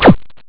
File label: zap; Amiga Soundtracker sample